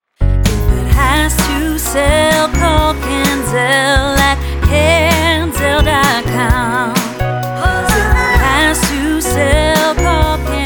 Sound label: Jingle; Canzell Realty